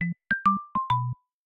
Falling Leaf, notifications; Galaxy

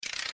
end, Keytone sounds Typewriter

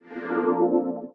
presence offline; Nokia; 770 Internet Tablet

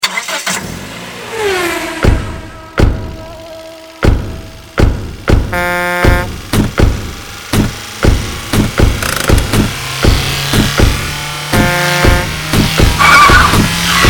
Big Beat Engine; Sony Ericsson; ringtones

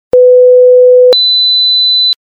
500Hz 2sec 4kHz 2sec; LG Wing 5G Verizon

